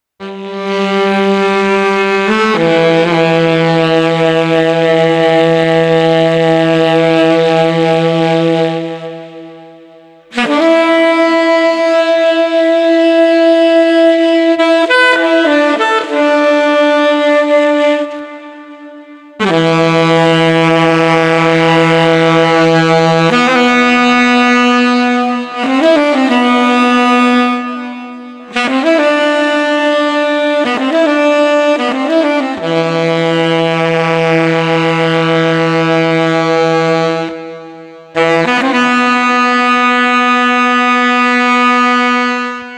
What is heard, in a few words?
Street Sax Heavyhearted 100